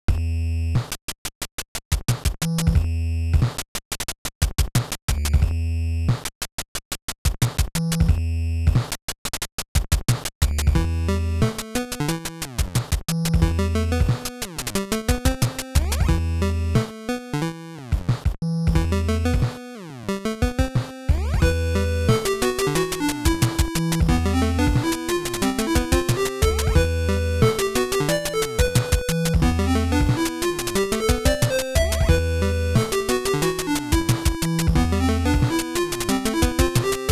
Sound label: music; Devolver Bootleg